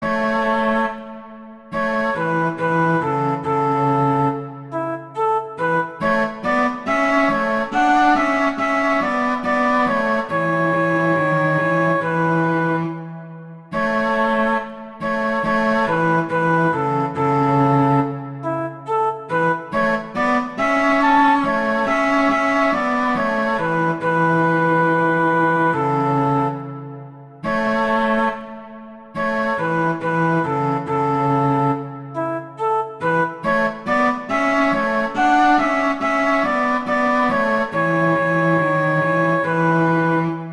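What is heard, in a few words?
Duet, horn; Mozart; Duets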